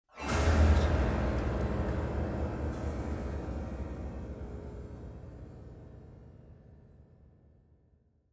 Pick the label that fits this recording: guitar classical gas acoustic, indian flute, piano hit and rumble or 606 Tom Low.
piano hit and rumble